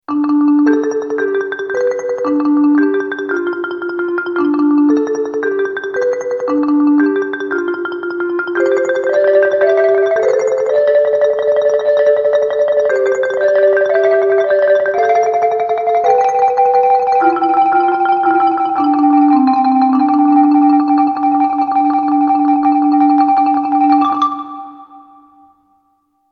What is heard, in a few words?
Mk I Marimba